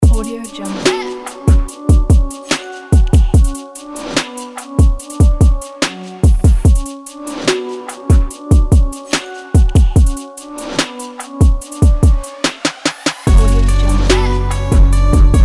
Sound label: trap hip hop music